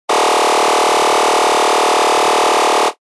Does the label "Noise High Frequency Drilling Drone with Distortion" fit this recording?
Yes